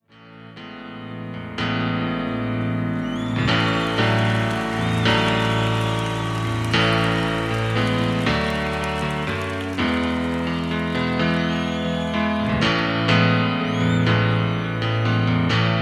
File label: Lonely Wind Piano Solo Live